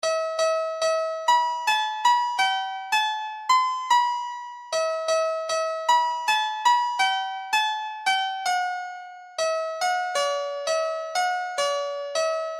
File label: soft piano; ddlj